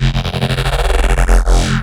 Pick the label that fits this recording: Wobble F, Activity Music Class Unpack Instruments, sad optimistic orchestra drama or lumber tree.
Wobble F